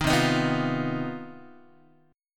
C Minor Major 7th Double Flat 5th C down Guitar 4A 4E 5A 5D 5G sharp; strummed; JGuitar